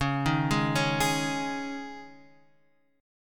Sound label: C sharp Suspended 2nd, C sharp, Guitar, Half Step Down